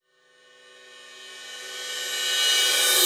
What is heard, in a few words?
Reverse Cymbals